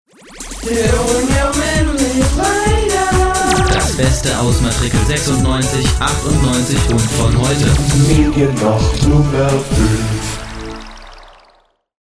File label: dance choir